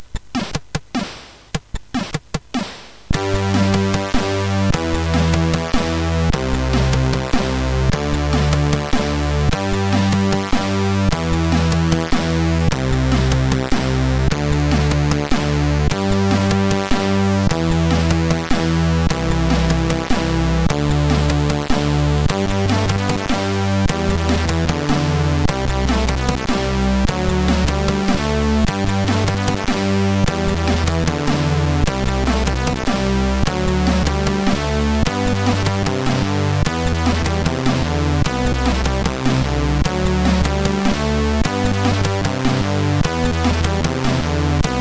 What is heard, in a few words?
High Score, sid MOS6581